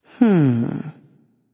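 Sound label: thinking, female; smite; voicepack; hera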